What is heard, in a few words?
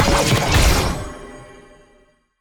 Attack Heavy; bloom minigame